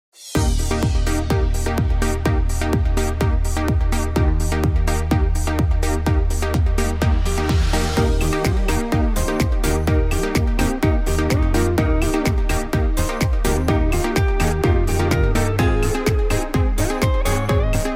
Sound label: harmony sample